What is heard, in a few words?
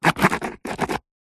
office pen biro ball point sign signature on cardboard piece